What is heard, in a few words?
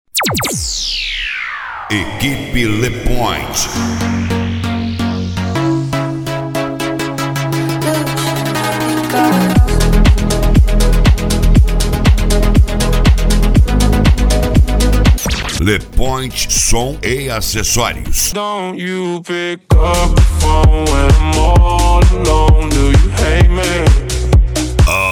deep house